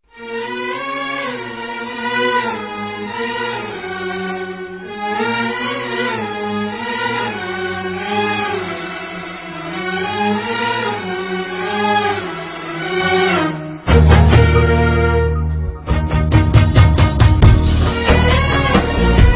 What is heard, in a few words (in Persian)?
ahange bi kalame; turkiey; آهنگ های بی کلام گیتار ترکیه ای